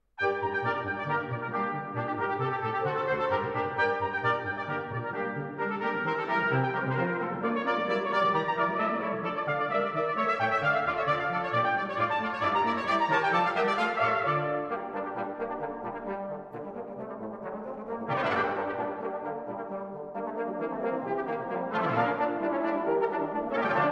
Badinerie, Brass Ensembles; Bach; Suite aus den Orchester-Overturer - V

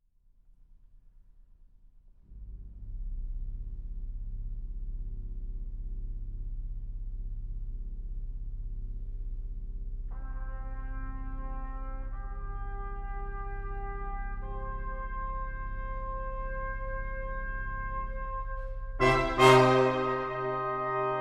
Zarathustra Fanfare, Brass Ensembles

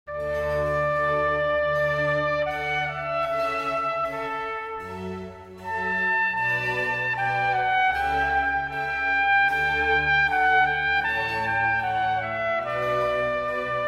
Concerto for Trumpet, Strings and Continuo Adagio; Telemann; Trompetenkonzerte

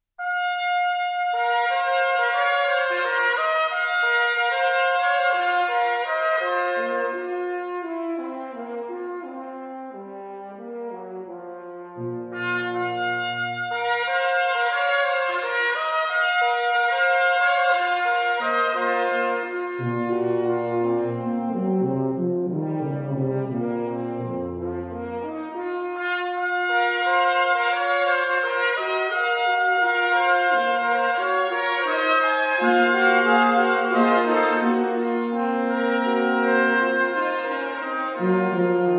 Quintet Poco lento, Brass Ensembles; Holmboe

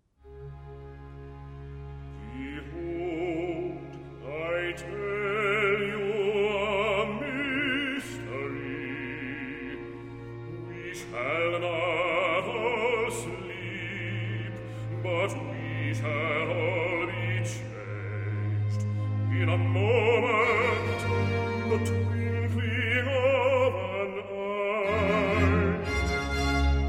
Bass Recitative, Boston Baroque, Handel Messiah; Part III - Behold, I tell you a mystery

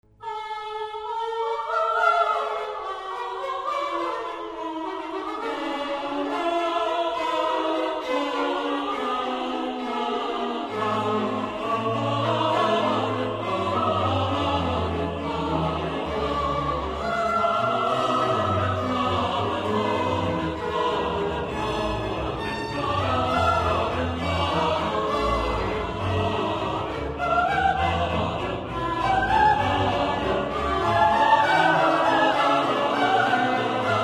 Amen, Orchestral; Boston Baroque; Mozart Requiem

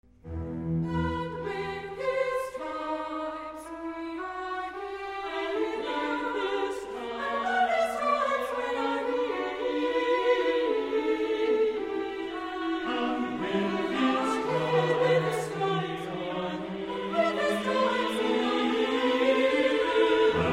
And with His stripes we are healed - Chorus; Boston Baroque; Handel Messiah

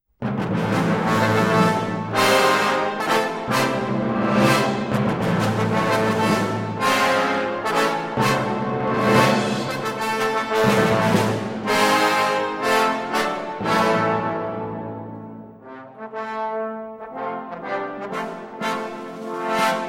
Fanfare, Trombone; Cress; CALA

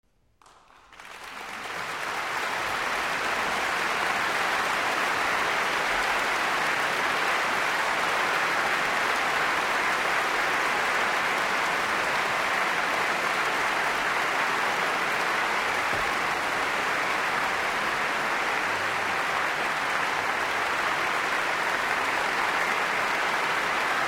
Applause; Munich Philharmonic; Tchaikovsky - Mussorgsky

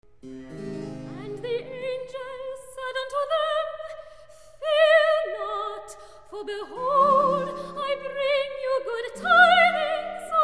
And the angel said unto them - Soprano Recitative; Boston Baroque; Handel Messiah

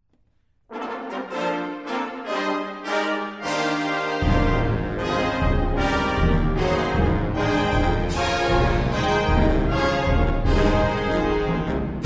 Divertimento in F - Fanfare, Wind Ensemble

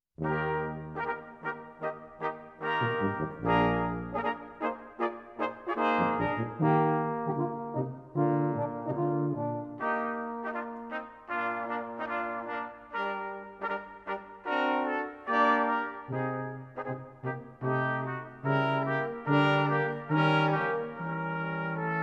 Brass Quintet Maestoso Alla Marcia

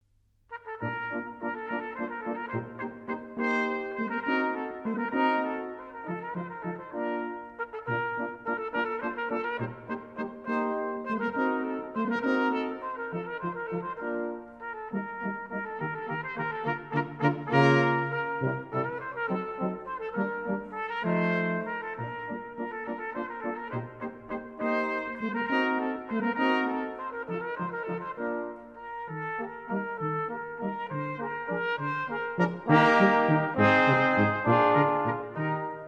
Brass Quintet Scherzo

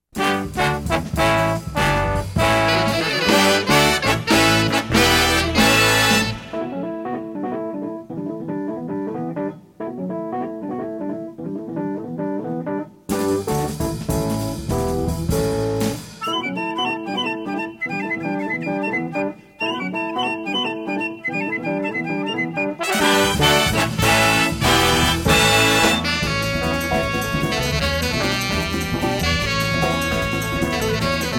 Bass Folk Song, Jazz

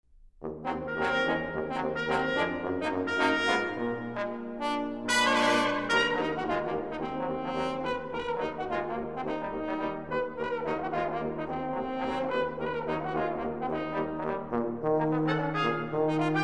Allegro ben ritmato e deciso, Brass Ensembles; Preludes for Piano - III

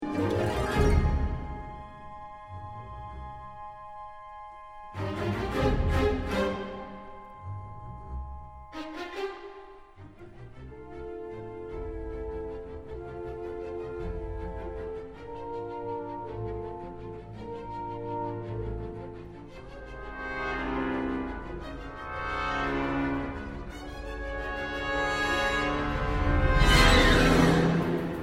Violas, Cellos & Basses; III - In ruhig fliessender Bewegung; London Symphony Orchestra; Mahler Symphony No. 2

